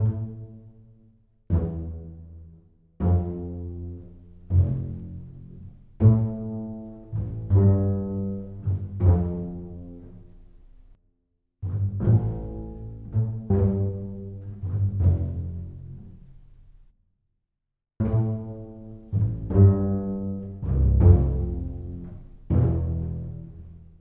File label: bass pizz; пиццикато